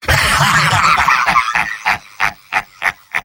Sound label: sniper laugh long; vo; tf2; mvm